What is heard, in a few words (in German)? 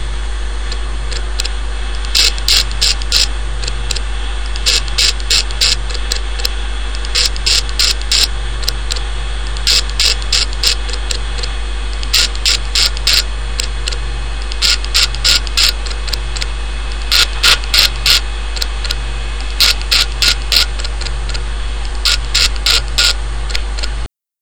ibm festplatte kratzt